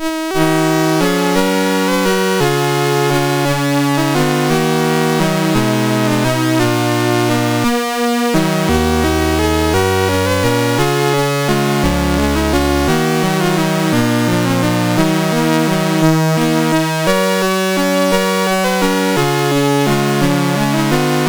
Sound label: sid MOS6581R2